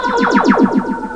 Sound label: Mysterious sound; Grizzly 1.3.1